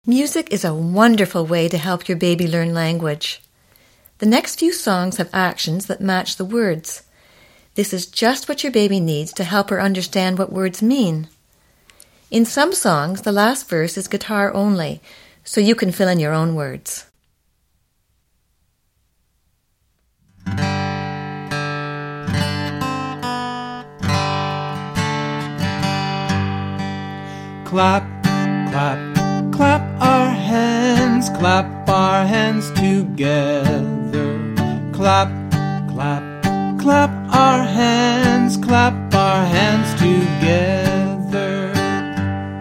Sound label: Clap Clap Clap Clap Our Hands